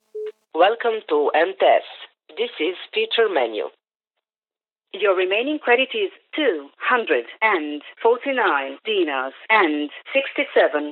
phone answering machine; devices accessories utilities; NS Studios - ultra sound collection

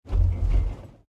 soft road bump; Blind Drive

